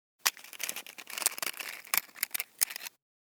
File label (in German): Streichholz rausnehmen